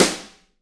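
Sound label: snare drum; Best Service Gigapack 2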